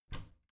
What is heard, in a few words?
bathroom cabinet both open soft